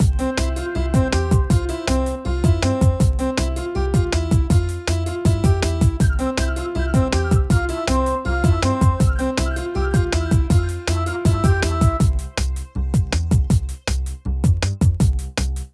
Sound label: race; bibi tina 3ds gamerip 2014